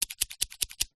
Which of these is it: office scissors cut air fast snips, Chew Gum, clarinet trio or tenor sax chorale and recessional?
office scissors cut air fast snips